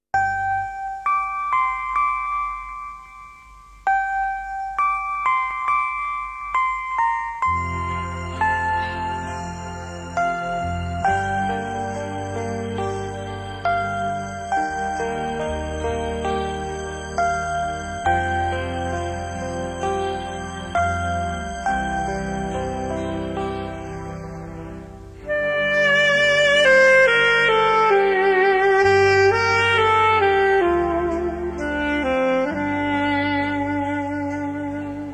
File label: Instrumental; ghayal 1990